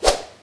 punch miss

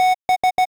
cryo warning; civ13; civ14

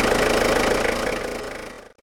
diesel ending, Vehicles Engine

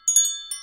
bells, Footsteps; civ13; civ14

